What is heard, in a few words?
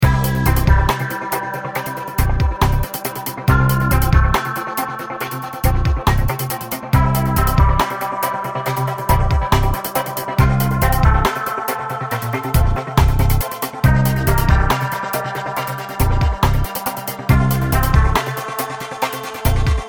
loop echo train